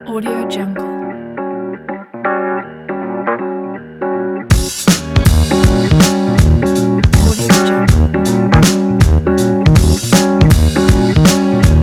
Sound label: retro funk logo